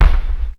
Kick, Ensoniq ASR-X Pro

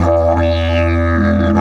150, DIDGE RIFFS; Big Fish Audio - Didgeridoo and Other Primitive Instruments

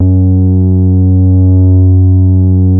BASS STOP

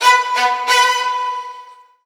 STRGS, Roots Funk; USB Soundscan vol.41; AKAI

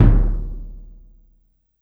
B1 Gran Cassa, Yamaha PSR-E323 Symphony Kit

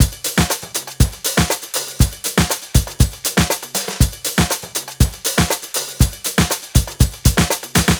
120 beat full, Nu Jazz House; PowerFX

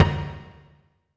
Toms, Elektron MachineDrum SPS1 MKII

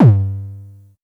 High Kicks G2; LIMAT Div Dept; Drums

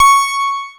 C#, PIANO; Big Fish Audio; Synclav FM

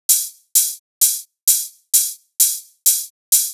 Hi-Hat, Afterhours EDM

